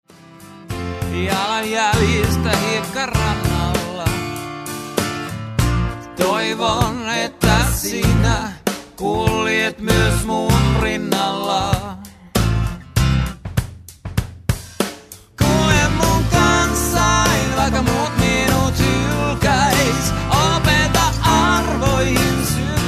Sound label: unplugged